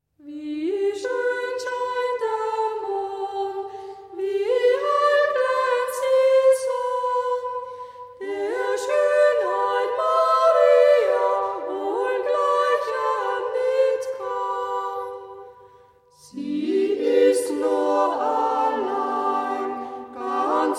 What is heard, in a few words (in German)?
chor; Wie schön scheint der Mond; Marienlied aus Tirol; Chor Haag